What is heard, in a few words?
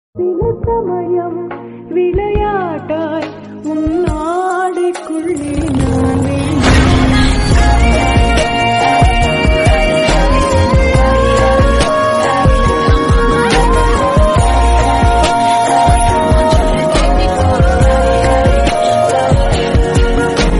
remix